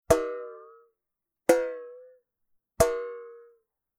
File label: pot hitting